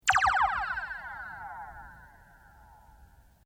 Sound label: Toy Reverb Spring Strike, Strange Toys; Miscellaneous Toy Sound Effects; Miscellaneous Toy (Sound Effects:Toys)